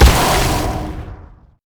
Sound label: launch; LoL; syndra